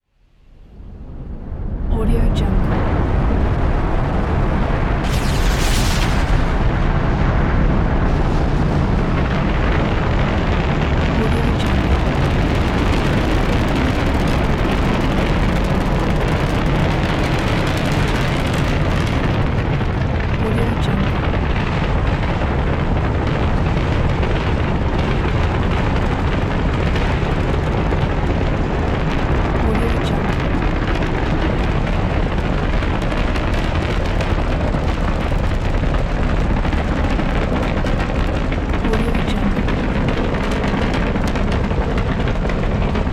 rocket launch

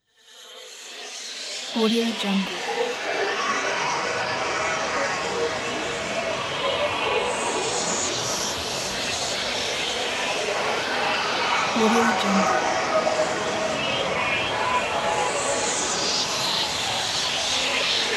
strange dream ambience

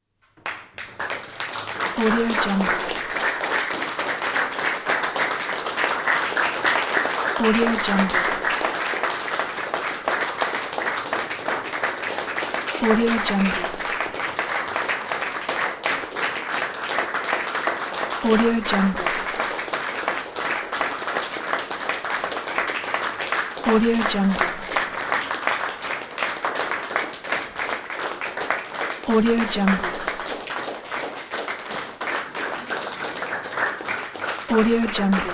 audience applause

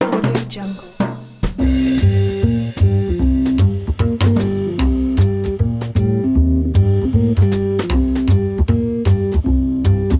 smoky bar jazz gig

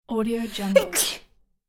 female sneeze

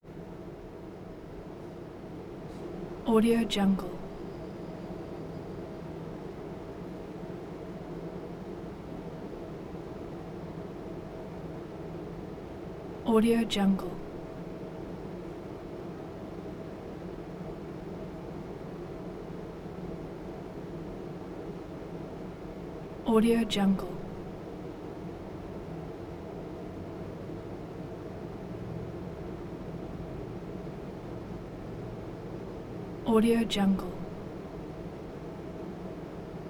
air conditioner ventilator blower clean hum

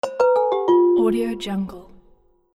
fail game; andreimodernmusic

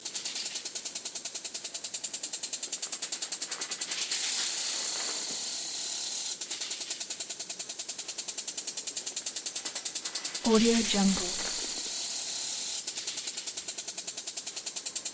household water sprinkler loop; blazznet

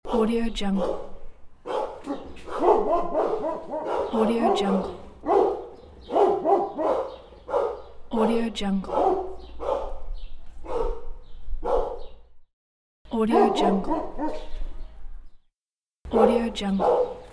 dogs

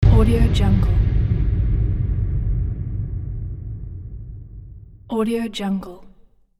dramatic hit impact boom

